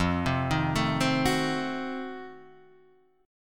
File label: F 7th Flat 9th F down Guitar Half Step Down; F7b9